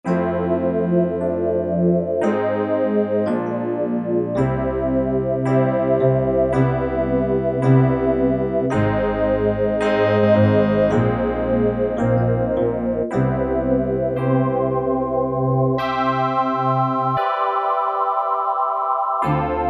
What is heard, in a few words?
VOICE EP, Casio VZ-10M; VZ ROM Card